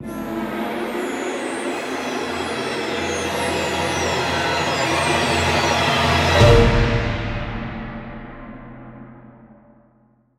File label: Music Stings; Tv station